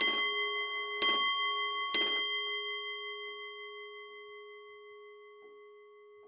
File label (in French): horloge